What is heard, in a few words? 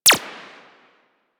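machine gun tech; Warframe; Archwing; Weapons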